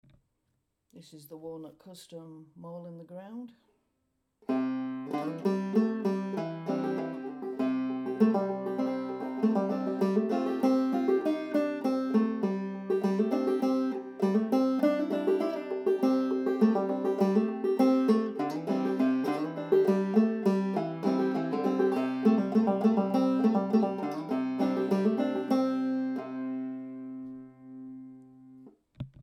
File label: walnut custom, banjo; ws walnut; mole